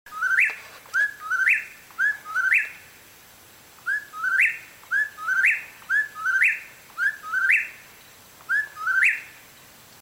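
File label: forest birds singing; chim rung hot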